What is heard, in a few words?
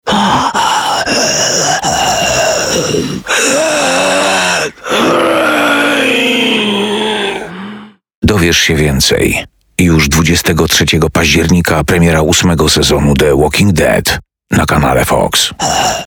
Zombie; premiera 8. sezonu Walking Dead